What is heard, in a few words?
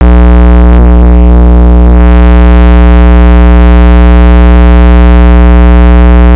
scream